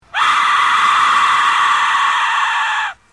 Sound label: aztec whistle